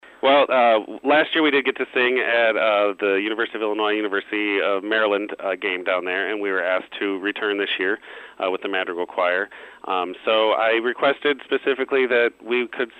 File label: choir